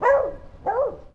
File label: Env Grass Oneshot Dog; PS Vita, PS4; Super Exploding Zoo